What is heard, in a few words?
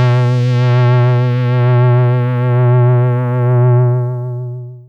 SYNTH; Beevilzzz